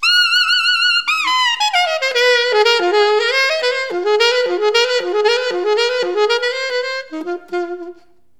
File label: Melody Alto In F7, acid jazz